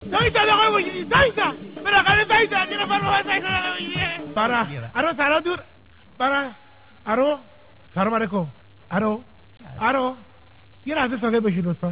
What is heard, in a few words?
Ringtone